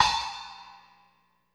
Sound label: LOFI HAT, LO-FI KITS; Spectrasonics - Burning Grooves CD2